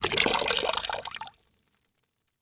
Liquid Water Pouring Into Water In Plastic Bucket Light Short Fast